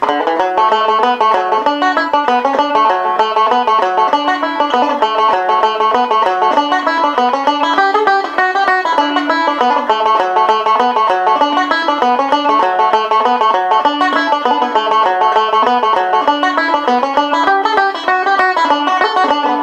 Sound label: reel banjo